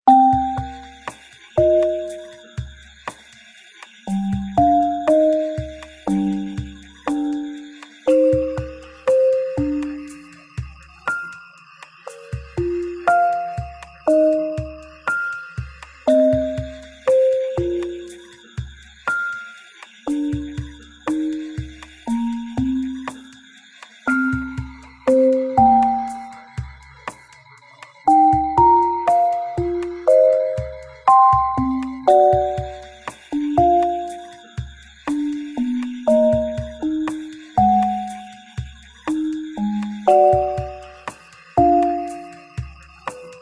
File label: World 1 Music; Cubicity